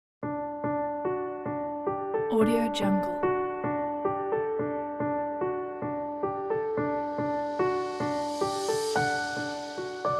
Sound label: cinematic soundscape music pack